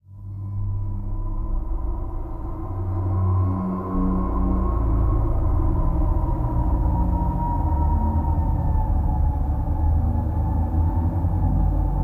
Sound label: Amb WasteLand; Ladianes